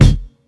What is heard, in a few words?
focused steel kick drum sample E key; Duration 0:01; kick drum samples